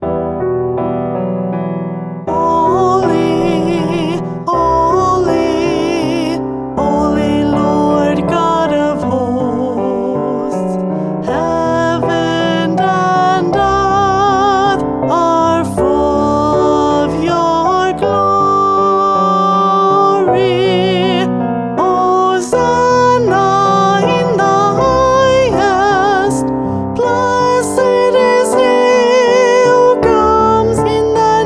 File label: MASS PARTS Sanctus English